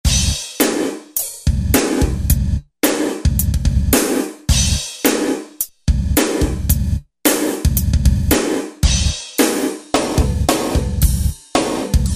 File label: Gate Drum, Korg T3